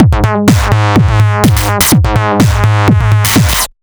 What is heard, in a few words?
Huge Crush, Drum Loops, 125 BPM; Sonic Foundry (Sony Creative Software); Underground UK House & Electro